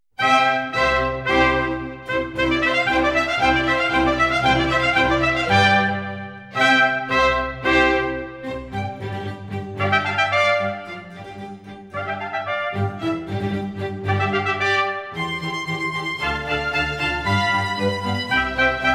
Concerto For Two Trumpets In C, Allegro

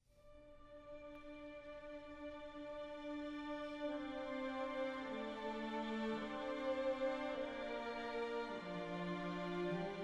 Elegy for Trumpet, Strings, and Piano

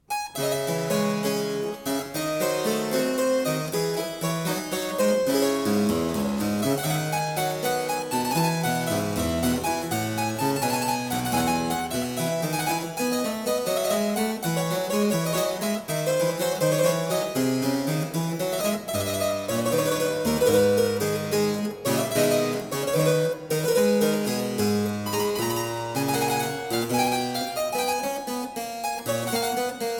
Suite I in D minor 2e Gigue, Harpsichord; Music for Harpsichord

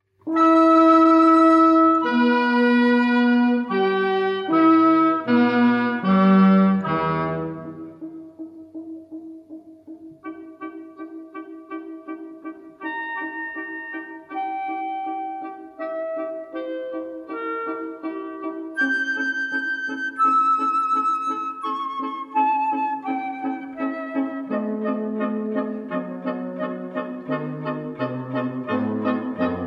Quintet In E Minor, Wind Quintet

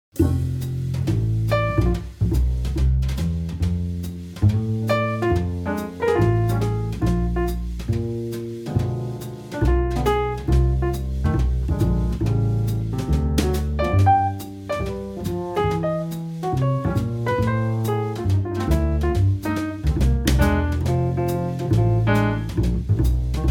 Blues

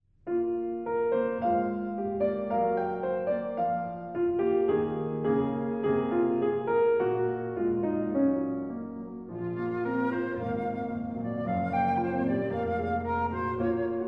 Sonata For Flute & Piano, Heiter bewegt

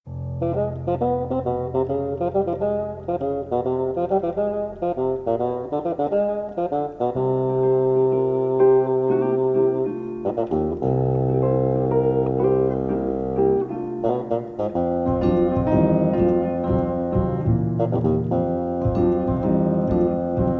Jazz Suite for Bassoon, Allegro

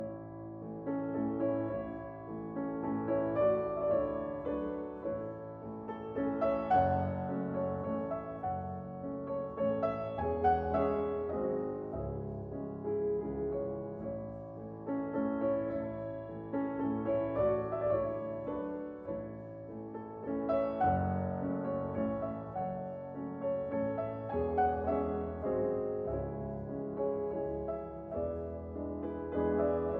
Waltzes For Piano, four Hands, in A Major; Brahms

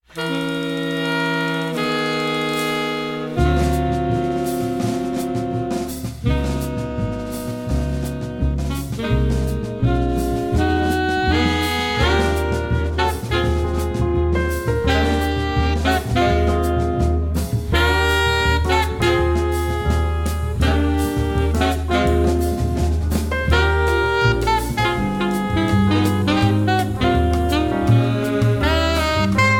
Samba; At The Bouquet Chorale

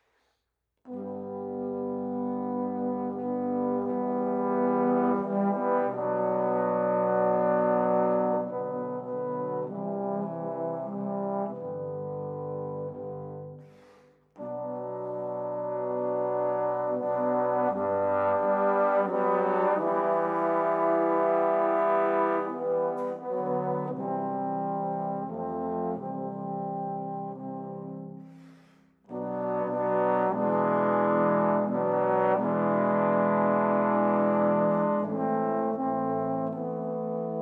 Hymn

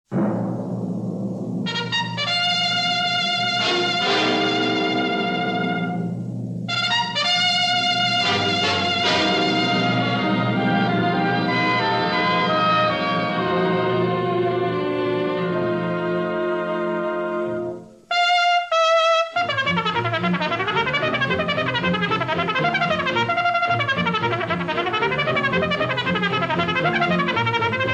Mexican Hat Dance, Trumpet

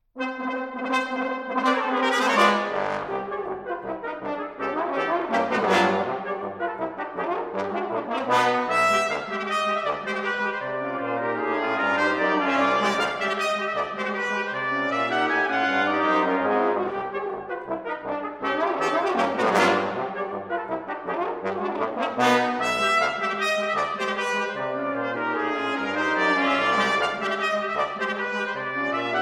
Brass Quintet, Finale; Brass Quintet No. 1, 'Circus'

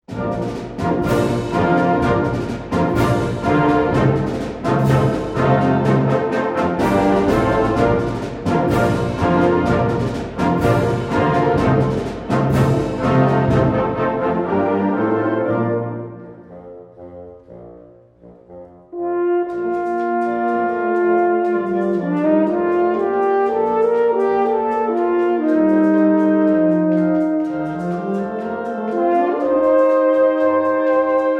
Concerto for Horn and Wind Band

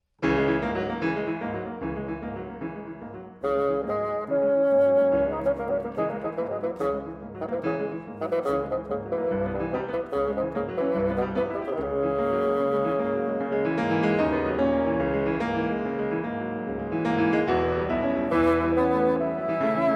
Sonata For Bassoon & Piano, Allegro energetico

